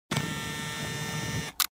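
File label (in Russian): звук камеры 2 длиннее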